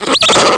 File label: whinny, cartoon